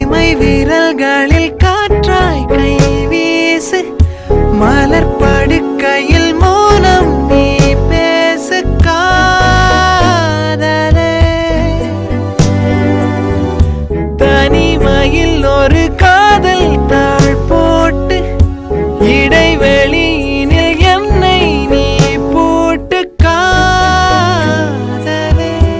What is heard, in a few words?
Tamil; Pizza